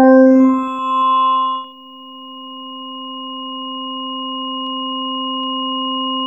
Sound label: Syn C5, Fairlight CMI IIe Keyboards